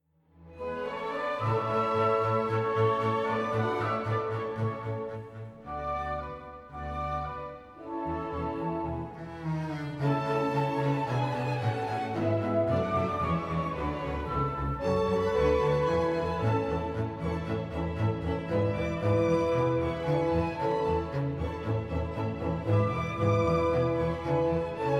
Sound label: Clarinet Con A